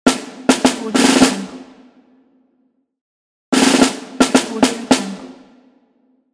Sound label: military drum roll